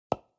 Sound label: construction wood